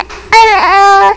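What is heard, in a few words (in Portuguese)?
INSATISFAÇÃO, SONS PEDIÁTRICOS; PEDIATRIA UFF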